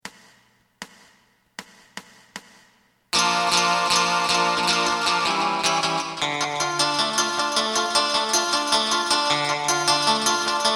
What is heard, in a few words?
drum